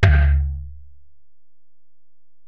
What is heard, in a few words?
Tom, Alesis DM-PRO, Drum Machines, Toms